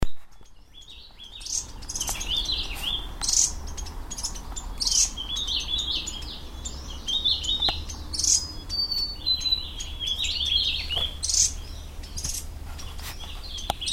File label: parrotbill golden Suthora verreauxi